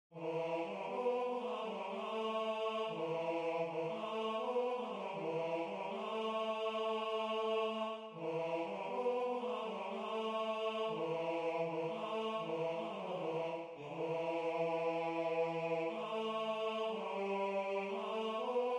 Eyn Boor, Synthesized; I think this was in two-part harmony, but it may have been in unison.

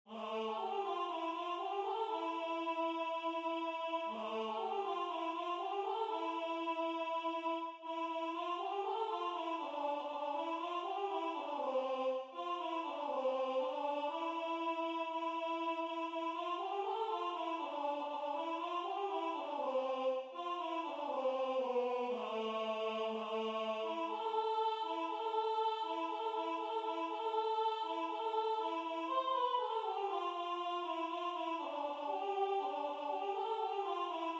Lo Aleicha, Synthesized, sung in unison; I think this was sung in unison.

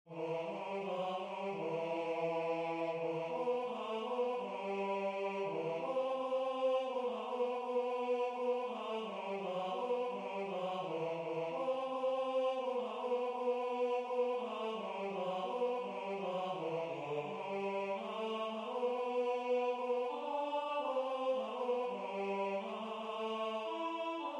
Ben Bag Bag Omer, Synthesized, sung in unison; This was sung in unison.

